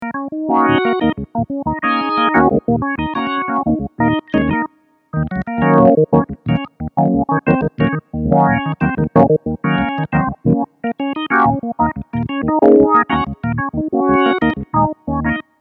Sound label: Hammond XK 4 MFX1 Wah Wah